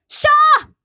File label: Fight Yell